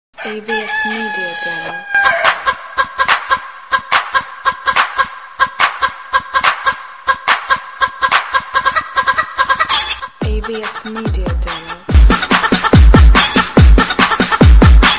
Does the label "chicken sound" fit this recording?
Yes